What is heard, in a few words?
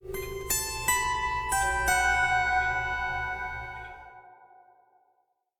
sting; minecraft story mode gamerip 2016; Access Denied; Harper